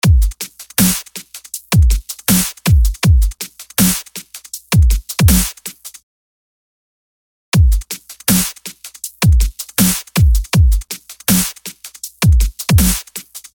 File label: drums expanded